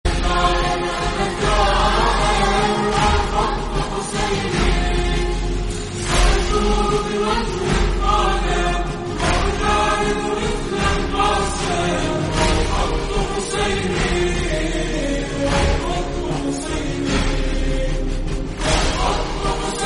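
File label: slowed reverb naat islamic arabic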